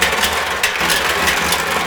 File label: move loop, ladder